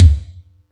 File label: Yamaha EX-5